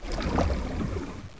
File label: swim; XDM